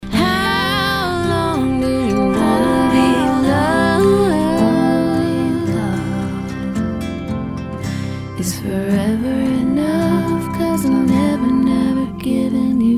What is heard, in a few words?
Lullaby